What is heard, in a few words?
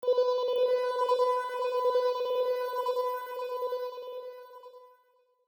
pad